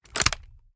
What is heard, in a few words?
selector on; weapons; viper